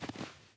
snow step

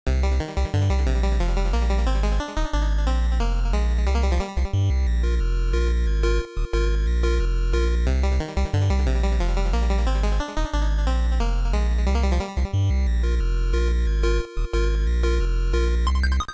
bgm continue

